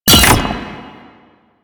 Grenade Bounce; Lasrian Goonadier Grenade; Lasrian Soldiers; Weapons

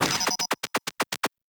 Transition Go; Warframe; Caliber Chicks Minigame; Transitions

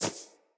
Generic Pickup Auto

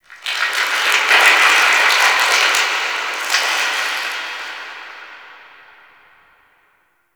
Ice Distant Ice Melt, Ice Planet; Warframe; Corpus Ice Planet; Ambience

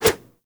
Generic Flip Whoosh; Warframe; Characters

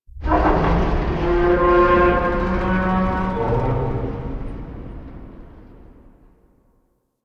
Ambient Tank Vocal; Warframe; Nineteen Ninety Nine

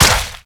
Step Default, Infested; Zealoid Prelate

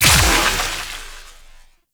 Explosion; Warframe; Moa Pet; Multron

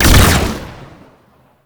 Concrete Archgun Shot; Warframe; Archwing

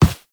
Run Default, Footsteps; Warframe; Operator

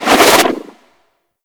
Sentient Rifle Retract; Warframe; New War

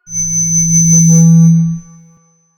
Mall Stage Feedback

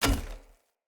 Short Sword Medium Impact Wood Hollow Large

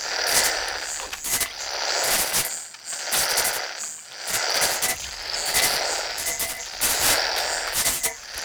Infested Safe Deposit Box Loop; Warframe; Storage Container

